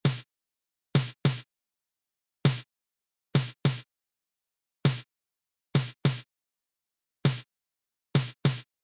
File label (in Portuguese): Toque - Caixa; fanfarra